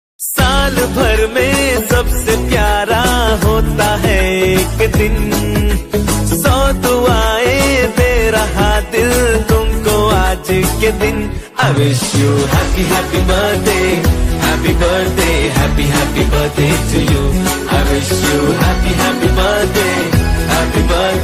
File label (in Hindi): Happy Birthday Hindi; ABCD 2